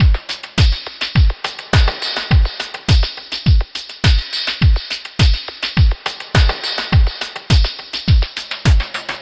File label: Drum Loop, Drum Loops